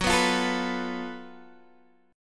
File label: Gb Minor Major 7th Double Flat 5th Gb Guitar Open D; 16,14,15,15,14,x; down; chord shape